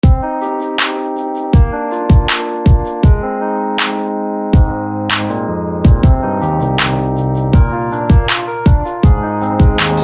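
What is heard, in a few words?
Rhodes; D-Rhodez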